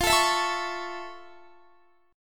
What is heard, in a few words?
F Augmented Major 7th F Guitar; F+M7; strummed